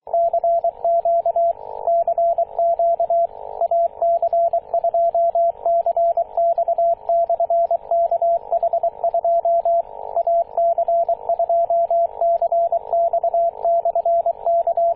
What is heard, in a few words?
21.027 MHz cw